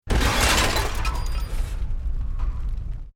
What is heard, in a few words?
EXPLOSION MEDIUM METAL GLASS